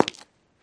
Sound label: footstep concrete